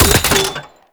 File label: grenade launcher mech; Weapons; Scaldra